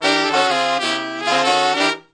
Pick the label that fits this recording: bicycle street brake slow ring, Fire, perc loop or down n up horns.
down n up horns